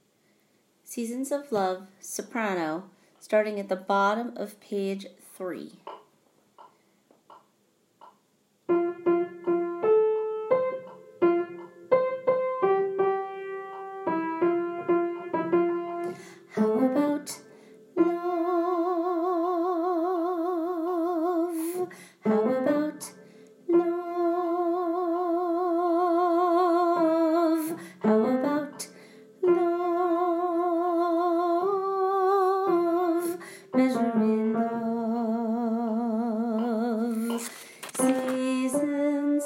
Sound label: soprano; Broadway Shabbat